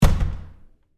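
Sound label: Door Bathroom Stall Close close-up Lite; Household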